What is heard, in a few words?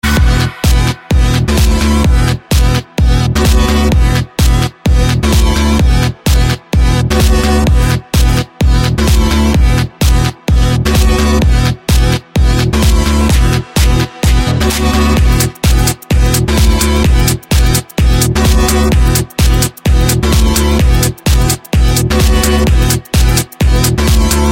bittersweet dance